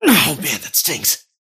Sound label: attack pain; dota; kez